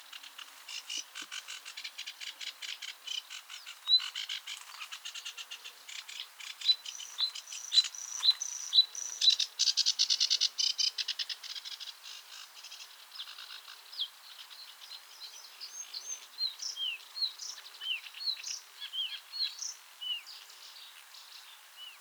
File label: Acrocephalus schoenobaenus, Estuaire Seine; RNN